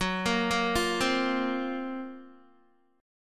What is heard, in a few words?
F sharp Major 7th Flat 5th, Guitar; arpeggiated; JGuitar